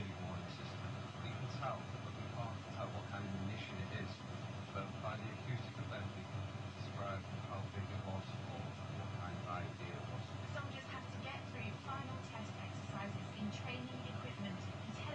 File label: interference boo